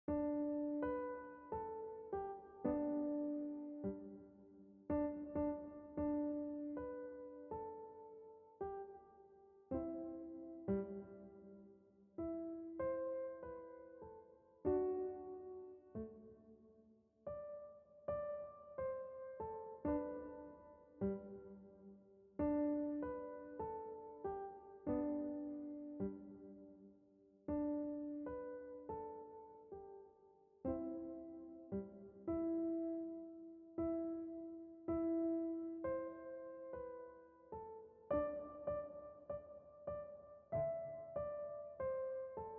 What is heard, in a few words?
Jingle Bells, student recordings